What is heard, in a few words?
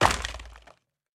Break Furniture